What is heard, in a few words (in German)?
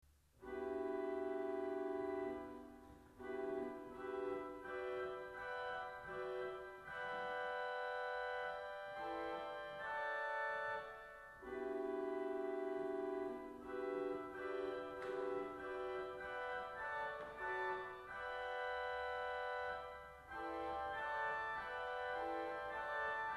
Rauschquint